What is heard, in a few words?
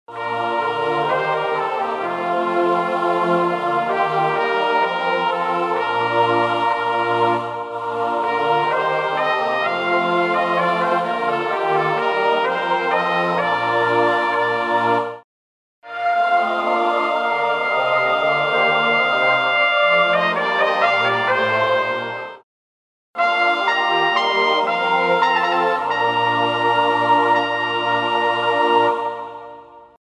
Trumpet descant; Ding Dong Merrily